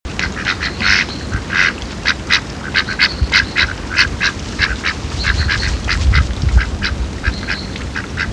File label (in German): erpel